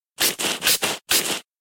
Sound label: Miner Shovel Dirt; Emote